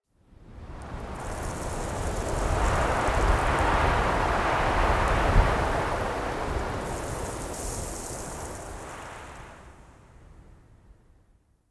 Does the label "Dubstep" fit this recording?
No